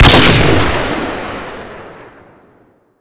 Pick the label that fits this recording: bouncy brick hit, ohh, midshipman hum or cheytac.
cheytac